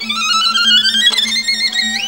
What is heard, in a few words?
VIOLIN LINES; Best Service; World Colours